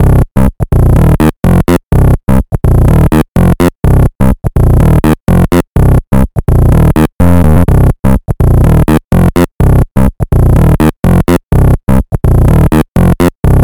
After 125 BPM Cm

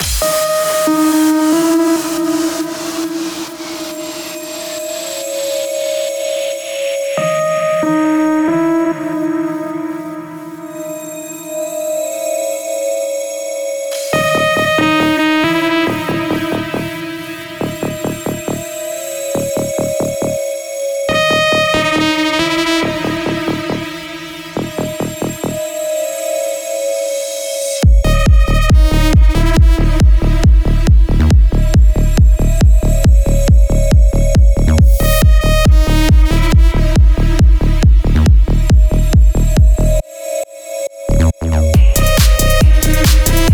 Psytrance; Unison

